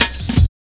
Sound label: jazz ridez cut, drum loops; Zero-G Jungle Warfare 1, 2 & 3